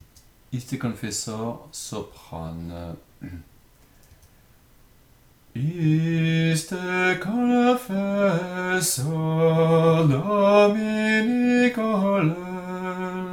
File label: soprano; Iste confessor